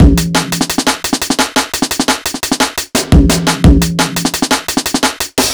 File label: E Beat, Electronic Beats